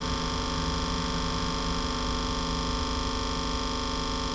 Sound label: MACH, MACH N SM; Total Control - Action Cutz